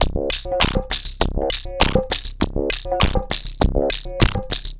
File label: perc 100 clomp, Percussive Loops, 100 bpm; Sample Magic - Transmission-X